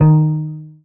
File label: marimba guitar